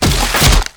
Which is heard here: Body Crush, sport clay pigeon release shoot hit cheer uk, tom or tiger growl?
Body Crush